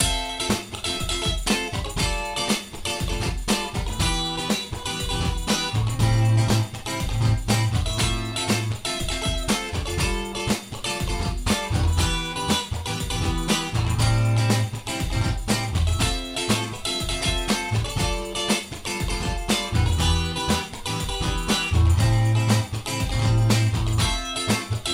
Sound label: cutting E acoustic bathroom